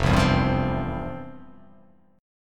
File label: F Major 7th Suspended 2nd, F, down, guitar; strummed; JGuitar